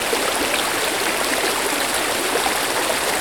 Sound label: ambience water small waterfall; smite; conquest